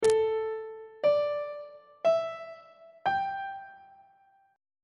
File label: g double sharp dominant 7th suspended 4th chord intervals on treble clef